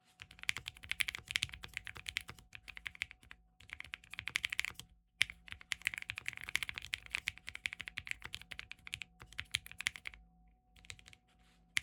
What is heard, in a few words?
MQ80 Typing, keyboard; iqunix mq80 keyboard